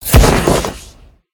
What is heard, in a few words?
Smoke Bomb End; DesertProwler